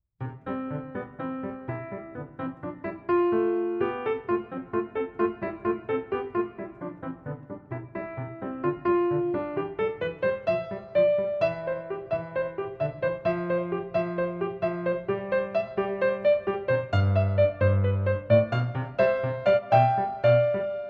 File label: piano